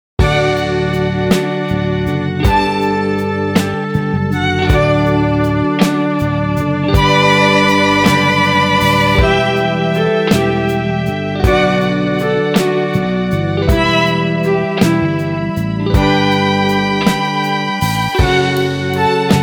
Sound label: Classical Sad Love Romantic; 我一辈子都在工作打鼓贝斯醇厚城市异想天开